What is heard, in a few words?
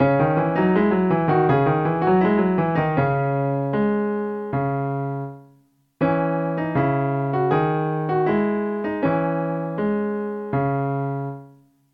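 dotted 8th note in D flat minor C sharp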